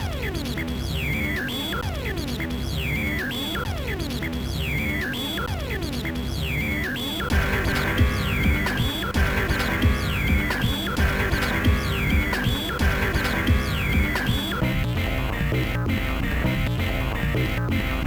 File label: BGM; Smashing Drive 2004 GBA